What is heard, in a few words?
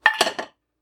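put frying pan; flypan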